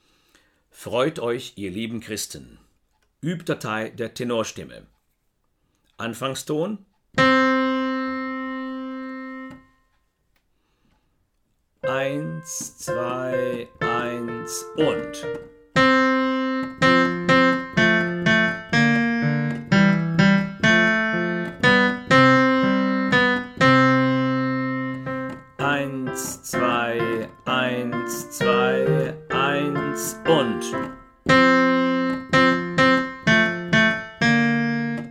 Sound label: tenor